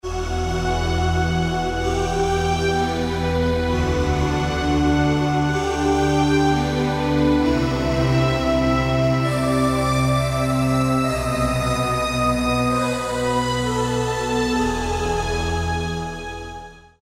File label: Yamaha TG33; ST-Eroid